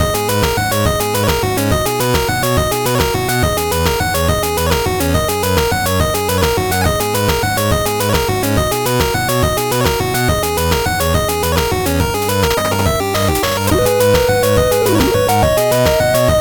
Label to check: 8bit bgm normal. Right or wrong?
Right